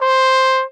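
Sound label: trumpet C hi mute plunger open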